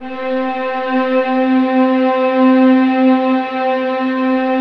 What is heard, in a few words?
VIOLINS D L, Synclavier Strings; ILIO